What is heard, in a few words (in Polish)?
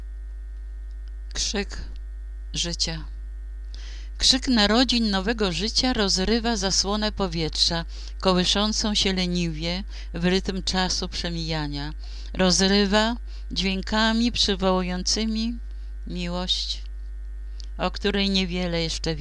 Krzyk życia